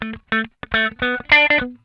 PICK IT, Funk Guitar; Zero G - Funk Guitar

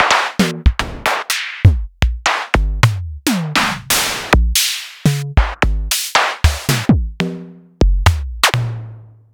Clavia Nord Drum, Samples Sliced

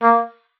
OBOE2, A#3; Xsample VOL-6

